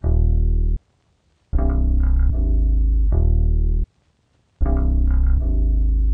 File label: Dark Melody Loop 156 BPM D# Min Bass; Loop Stems; Cymatics; 2020 Melody Collection